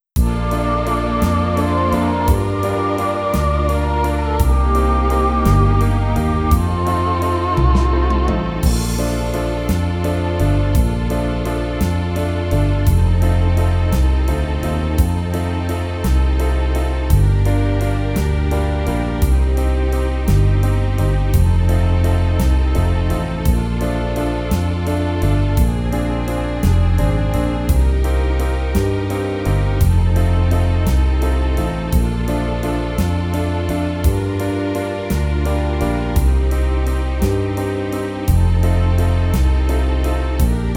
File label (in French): valse playback